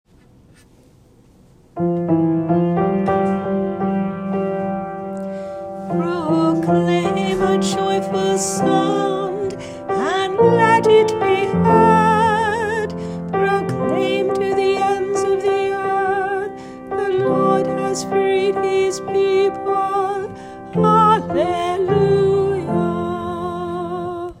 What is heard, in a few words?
Antiphon